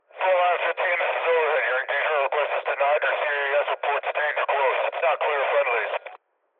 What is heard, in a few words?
Battle In House, Battle Crowd; Coll Anderson; The Battle Crowd Collection Add on; ADR